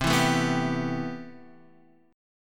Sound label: C Major 7th Add 6th C